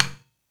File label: INDIAN PERC